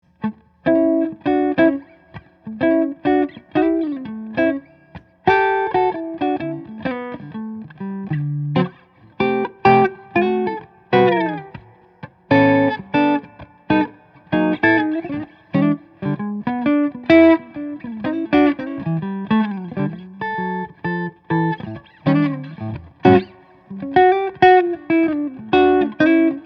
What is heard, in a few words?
Gibson ES-350P amp, electric archtop guitar; 1949; all maple